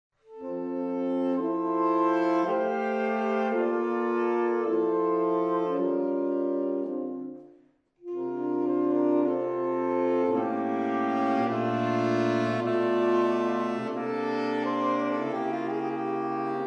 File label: Sax Quartet; Saxsational